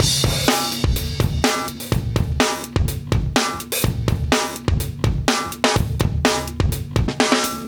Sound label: 125 live breaks